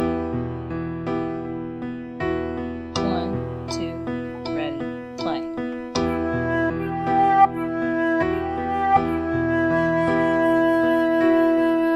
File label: A minor Scare